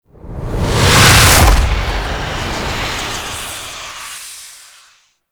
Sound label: Fly By Slow, Vehicles; Flight; Warframe; Liset; Stalker Liset Skin